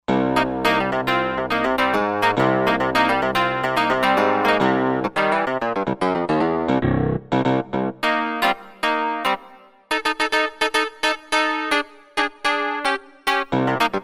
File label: Super Clav, Korg Wavestation A-D